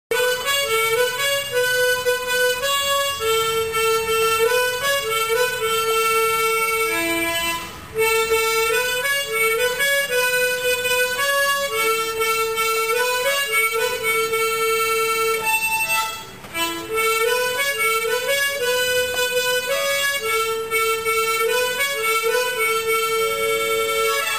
Piano Ringtone; Malgudi Days

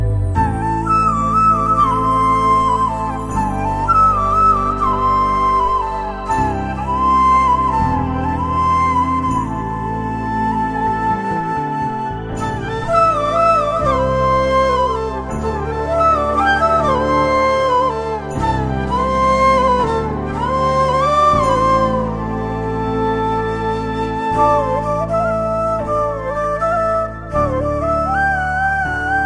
Flute Ringtone; 96 Movie

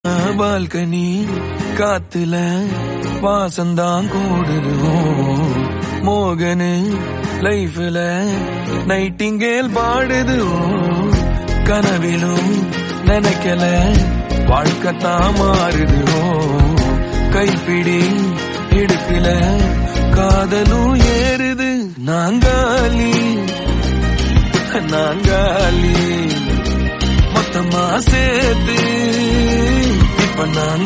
Tamil Ringtone

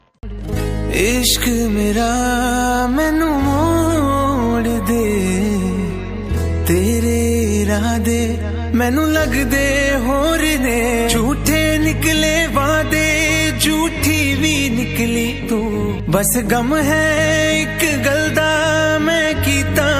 Song

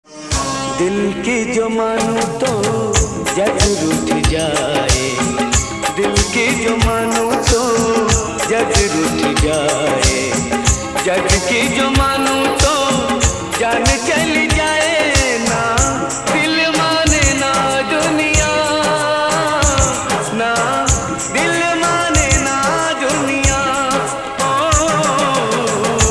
Hindi Sad

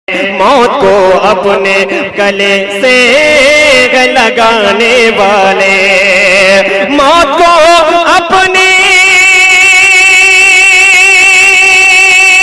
Naat Ringtone

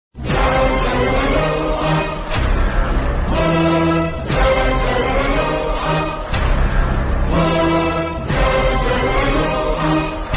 Villain Tamil Bgm Ringtone; Annaatthe

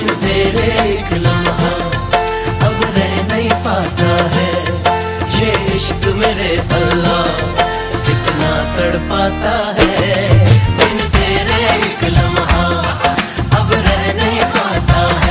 Bollywood Song Ringtone

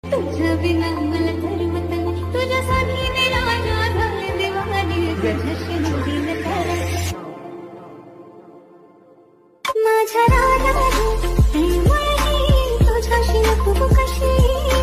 Marathi Love Song

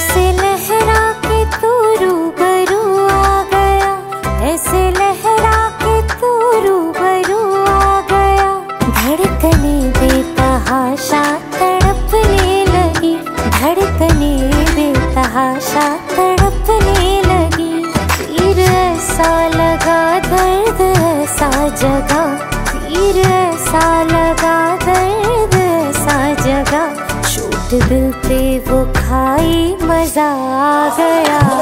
Female Ringtone

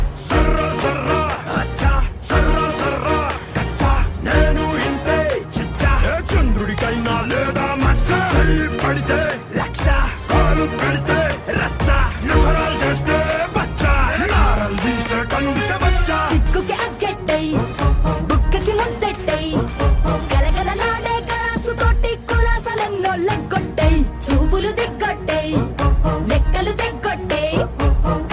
Telugu Ringtone

